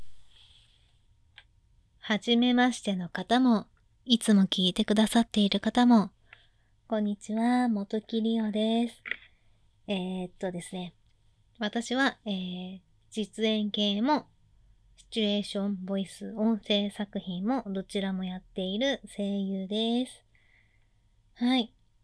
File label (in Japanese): プライベートオナニー実演; 声屋のひとりごと